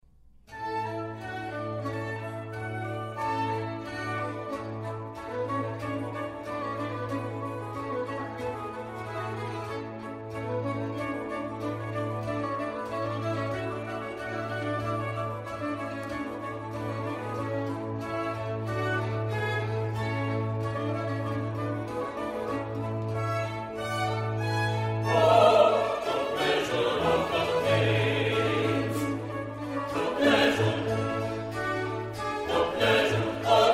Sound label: Choir; Choir Oh - the pleasure